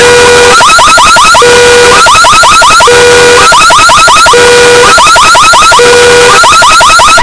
very loud and annoying car alarm earrape

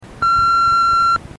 answering machine beep

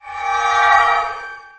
switch character sound; lego star wars

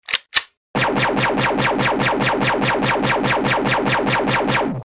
rapid laser firing; g i joe

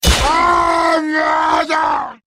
heavy critical death

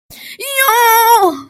yaaaasssss yaaaaaaaaa

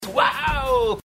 uaaaaaaau

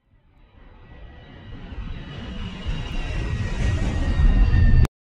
jet off

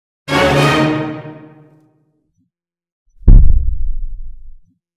cinematic suspense intense

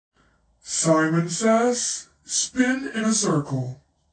spin; simon 3

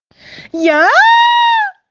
yay

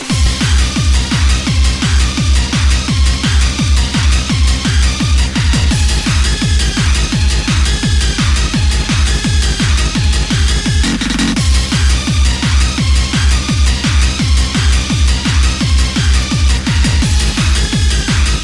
hardcore trance

